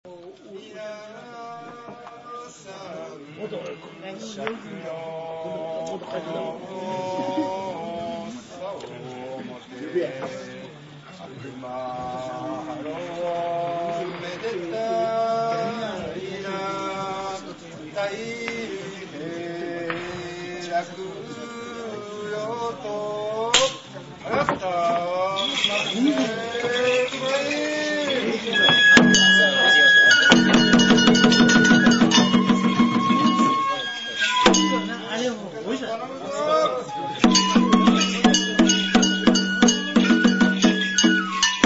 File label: banjo